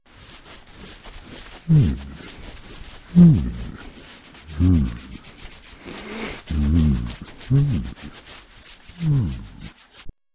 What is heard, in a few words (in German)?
Pflegen des Rauschebarts